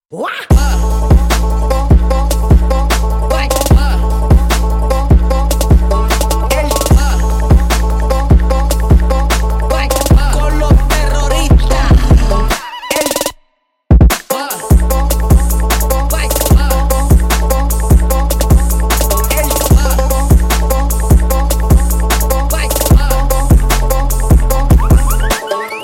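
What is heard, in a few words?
Banjo Trap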